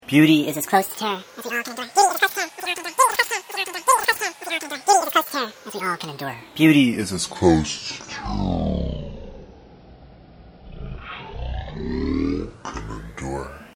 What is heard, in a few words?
Beauty loop